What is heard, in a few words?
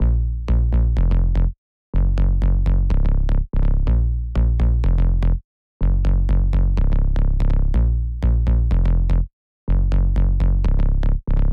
124 BPM A# Min Sub; Drop Loops; House; Cymatics; Reasons